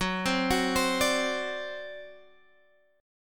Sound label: C Suspended 2nd Flat 5th F sharp